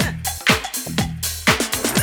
BREAK; Zero G - Man Machine

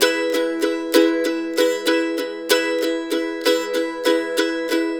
RIFF, Blues Guitar Samples

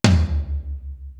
real tom; East West; Global Sounds

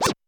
Scratch, Alesis D4

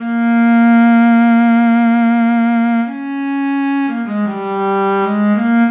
Cello, Trip-Hop Loops; Sonic Foundry (Sony Creative Software); Rondo Brothers Trip-Hop Loops & Samples; Hard Rocks The Roof

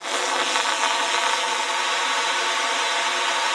G#3, ALTOSAX; Xsample VOL-2

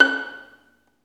STR Violin, Solo Violin FX

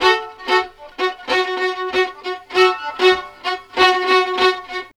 VIOLIN, Balkanish Traditions; USB Soundscan vol.63; AKAI

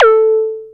TECHNO; X-Static Goldmine 1

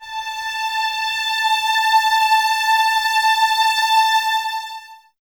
Violins Exprsive, String Ensembles

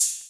Ride; Equipped Music; Loungin House

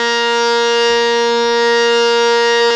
HARMONIUM, World Instruments

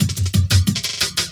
LOOP -L, Drum & Bass, 180 BPM; Ueberschall; Drum & Bass Resonance

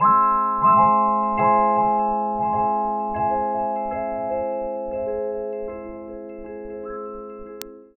Chyme; Sonic Foundry (Sony Creative Software) - Future Retro Dance Excursions

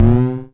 FREQ MOD, Dual Percussion Synthesiser; Klone

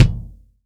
FBD FF ATT, FUSION KICK

